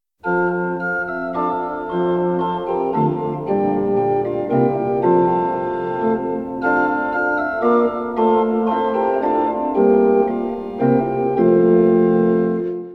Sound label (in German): Orgel; Schneckchens Hörreise